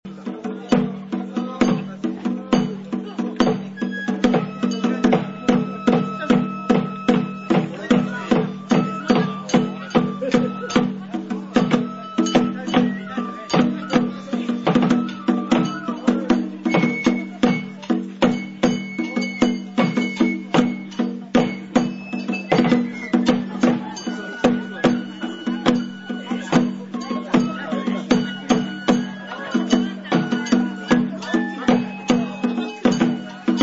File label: banjo